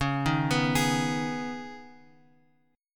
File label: C sharp 7th Suspended 2nd, C sharp; C#7sus2 Chord {X 4 1 4 X 4} arpeggiated